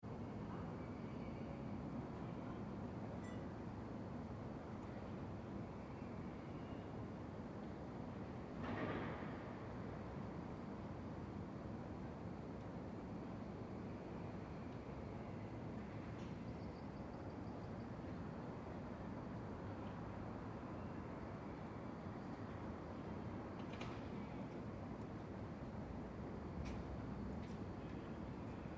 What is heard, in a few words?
Back Yard Silence Spring, Airy recording of a quiet backyard in springtime. Very subtle, almost u; Light bird song and voices in distance; City Sound Effects